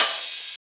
Open Hihats, Cymbals; Vengeance - Essential Clubsounds Vol.5

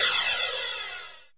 FX Cymbals, Percussion; Dance Synths

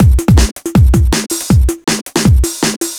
160 Manic Street Full, Drum Loops 160bpm; Zero-G; Soundclash Nu Dance Electro; Acidized Wavs

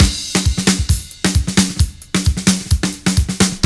NICE DRUMS, BREAKBEATS; Crypton - DrumBase5000; BREAKBEATS#2

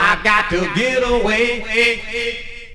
VOX SUNG; Megabass Remix! 2

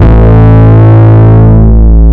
bass E; Zero-G; Chemical Beats; BASSES

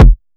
kick trim

